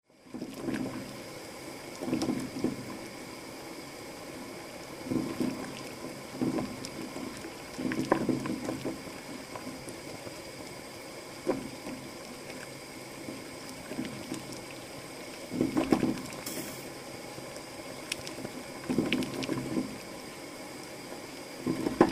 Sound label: egg boiling on gas stove